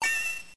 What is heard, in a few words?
exp max; Battle